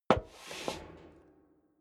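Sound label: step leather shoes metal rattle scuff, footsteps; schritte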